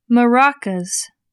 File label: maracas